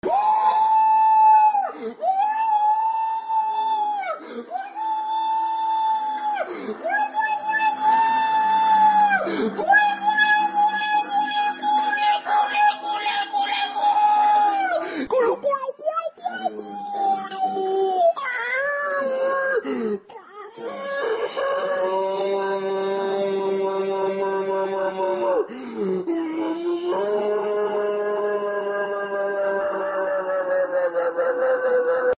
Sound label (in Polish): okrzyk bojowy; krakozja; wandystan